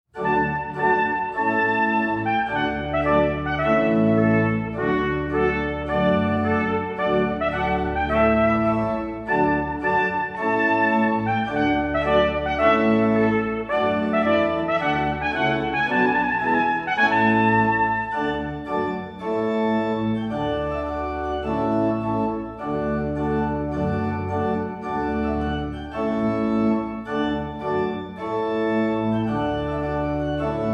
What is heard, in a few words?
Trumpet Tune; Clarke